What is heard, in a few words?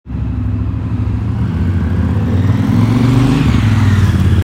Greater Grassmarket; mapping the soundscape